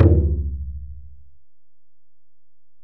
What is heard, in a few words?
Ac Perc, Drum Machines Alesis DM-PRO